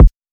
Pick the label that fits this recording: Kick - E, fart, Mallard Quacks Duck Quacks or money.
Kick - E